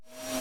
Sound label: Void Suck